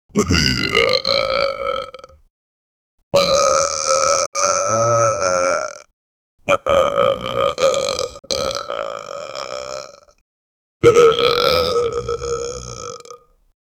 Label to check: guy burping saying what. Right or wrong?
Right